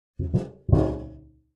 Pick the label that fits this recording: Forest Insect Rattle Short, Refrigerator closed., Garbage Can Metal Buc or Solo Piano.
Garbage Can Metal Buc